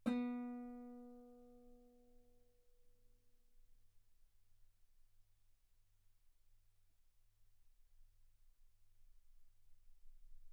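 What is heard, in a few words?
Guitar ff B string B3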